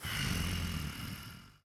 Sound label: grunt; fenrir